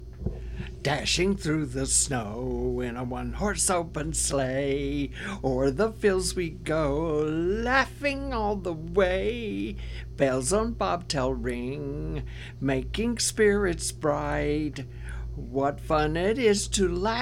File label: Jingle Bells